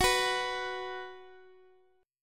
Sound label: B 5th Gb, chord